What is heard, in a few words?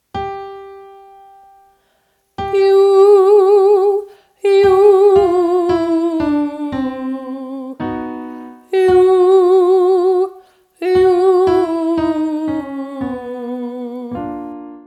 5 note scale yoo descending, vocal exercises